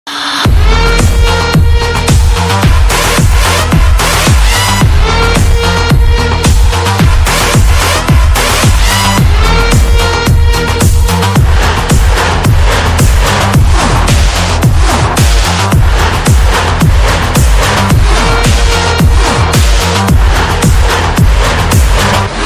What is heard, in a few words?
Bgm Ringtone; Toxic Movie; Telugu Ringtones; Yash